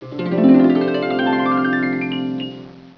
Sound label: SCALES